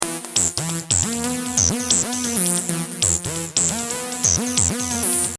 Hip Hop Synth Licks 90 Bpm E Swing; Nuff Spit; Synth Loops; Lynne Music

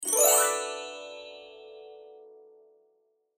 Harp Gliss, Harp Gliss Wind Chimes Short; Strings; Blastwave

